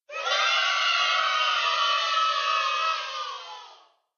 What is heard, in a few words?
Children Cheering